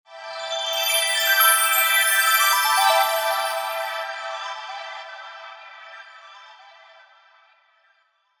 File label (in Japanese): kirakira